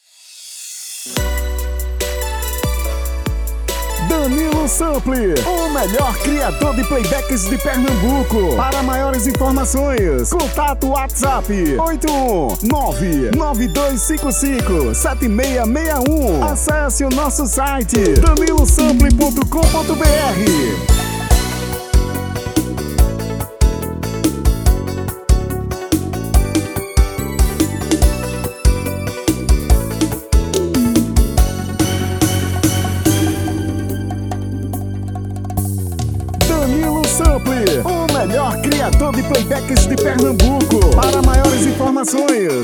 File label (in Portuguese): Brega